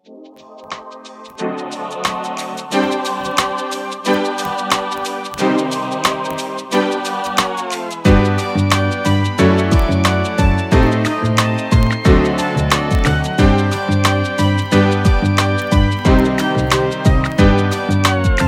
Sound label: LOFI MUSIC